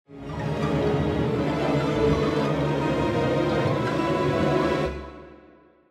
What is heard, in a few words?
Fear